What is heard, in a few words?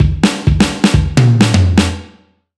future bass house loop